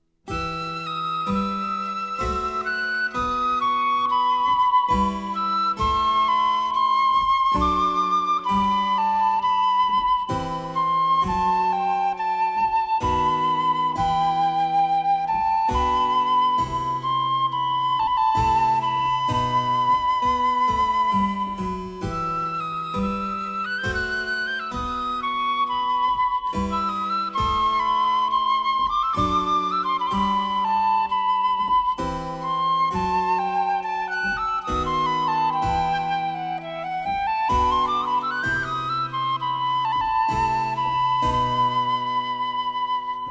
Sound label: ottavino; francesco geminiani